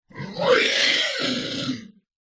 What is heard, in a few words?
science fiction alien scream